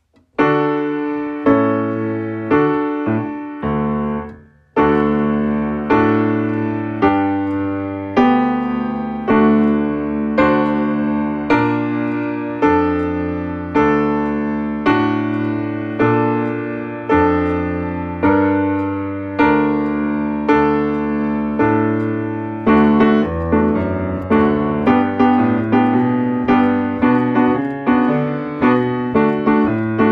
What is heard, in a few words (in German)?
Klavier